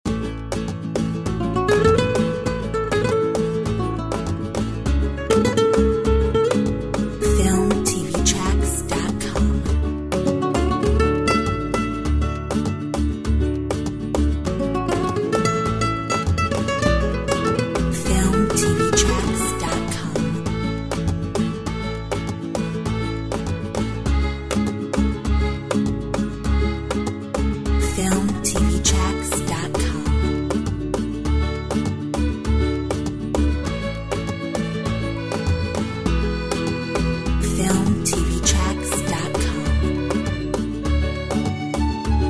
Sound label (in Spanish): Fiesta Latina